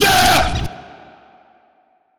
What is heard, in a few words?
hehe